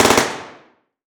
Weapon Gun Rifle M16 Multiple Shots Interior Shooting Range; AdobeCC